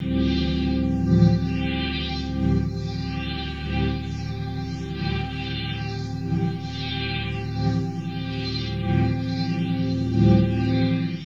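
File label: Pad Synth; Equipped Music; Loungin House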